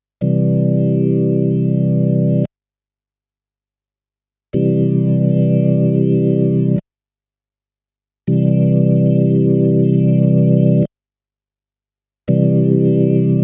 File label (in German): B3 Vibrato Scanner ohne V1 C1 V2 C2 V3 C3